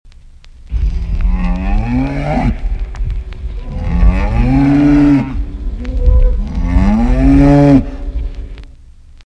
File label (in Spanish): toro